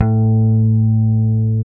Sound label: Basses, Roland